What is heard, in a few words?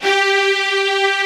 STRING SECTION G3, Strings; STRING SECTION #2